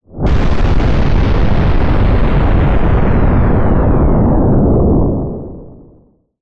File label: kaboom; Example scripts for the SAU language, and audio files rendered from them using the saugns